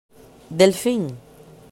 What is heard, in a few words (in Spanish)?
delfin; mausschool